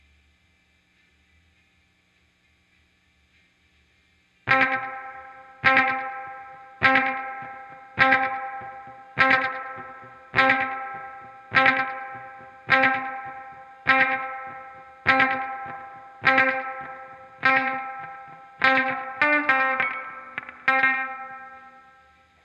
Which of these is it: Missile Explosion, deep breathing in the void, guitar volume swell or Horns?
guitar volume swell